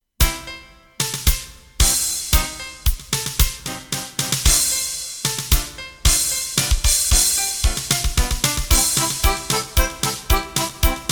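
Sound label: Morning Boogie accordion piano clarinet or flute guitar drums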